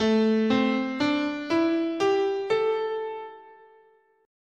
A Minor Pentatonic, scale

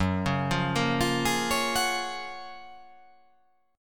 F sharp Major 9th

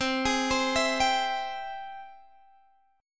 G sharp Augmented Major 7th C

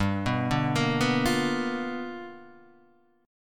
G 7th Sharp 9th, G, chord; G7#9 arpeggiated; JGuitar

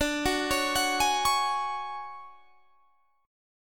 D Major Sharp 11th D, chord; DM#11 arpeggiated

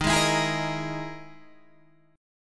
E-Major Sharp 11th Eb

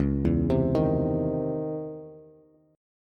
Db Minor Major 9th Db Bass; arpeggiated; DbmM9; JGuitar

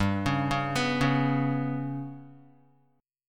G Major Flat 5th Guitar, chord; Listen to GMb5 arpeggiated

